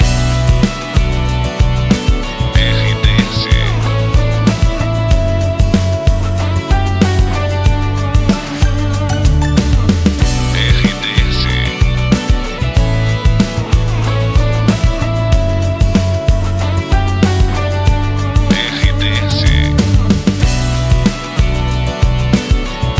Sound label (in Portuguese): trilha para locução; Pacote Cidade FM, Pato Branco/PR Emissora com perfil Jovem